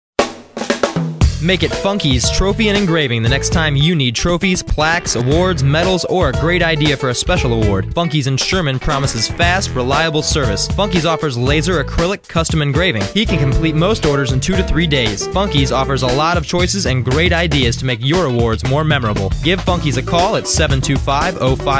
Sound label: Funky Radio Spot